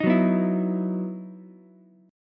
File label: E Major 7th Suspended 2nd E down Ukulele; EM7sus2 strummed